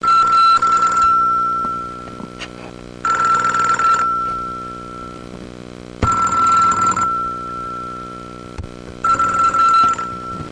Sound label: telephone